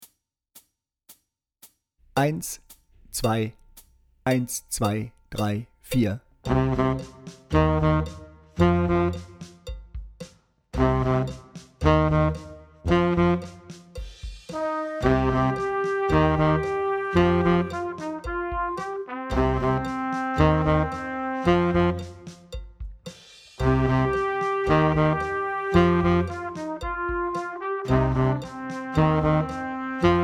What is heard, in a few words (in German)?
Heavy Metal Tenorsaxophon lauter